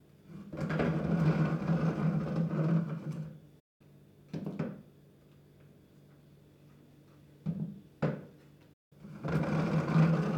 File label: Pulling Out Chairs In Different Ways